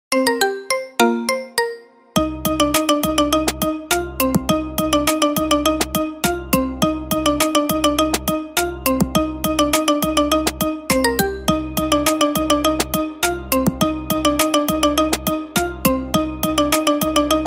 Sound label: marimba ringtone